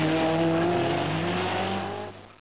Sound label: engine; coopers